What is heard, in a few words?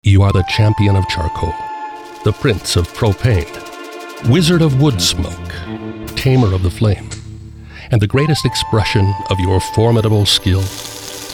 jingle tag